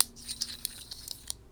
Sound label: Cable Coiler; Smol Mechanisms